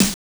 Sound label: Arcade Snare; Terminator 2; M8; Genesis